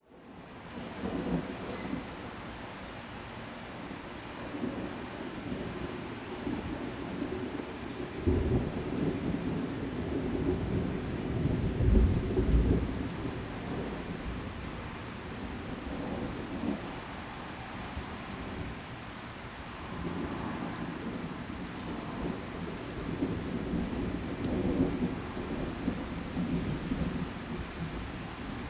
Weather Ambience After Rain Drizzle Distant Thunder Insects Swamp